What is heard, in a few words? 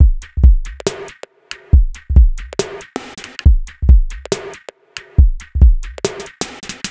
O2 Break, Bpm 139, Dubstep, Drum Loops